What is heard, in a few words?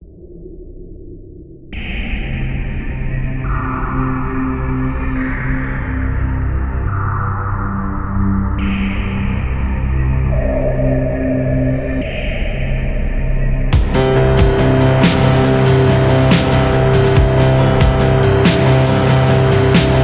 outro music